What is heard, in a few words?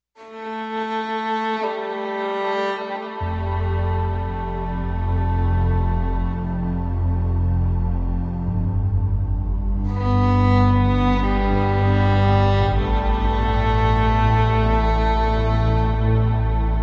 Violin